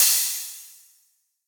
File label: Future Bass Crash; Drums; One Shots